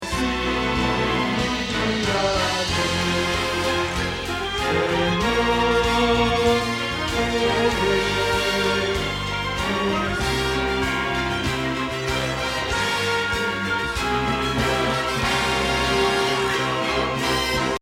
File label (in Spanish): Bajo